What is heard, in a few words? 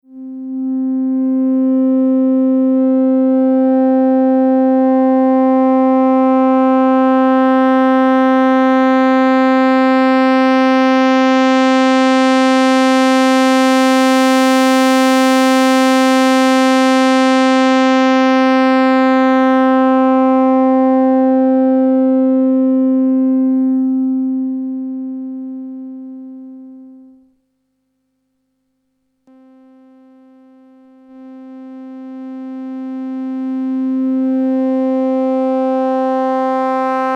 Gamma Filterfrequency Sweep